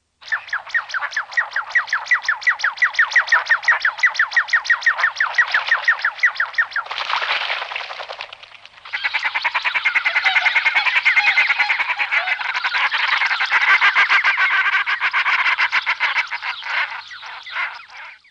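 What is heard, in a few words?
Common shelduck; Madarak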